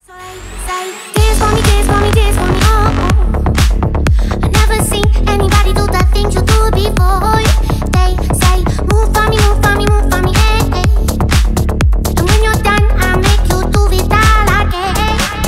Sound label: remix